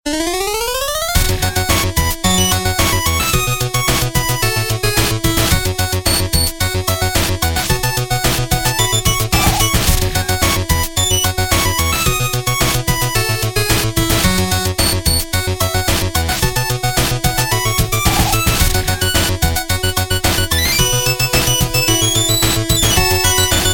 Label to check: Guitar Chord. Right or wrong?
Wrong